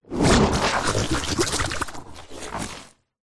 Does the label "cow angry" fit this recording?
No